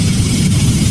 FLAME amb

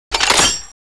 GrenadeLoad

